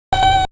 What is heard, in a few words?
beep sound effect